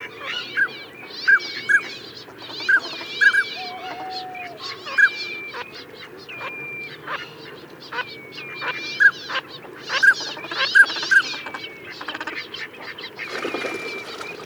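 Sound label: northern pintail anas acuta; zvuki utok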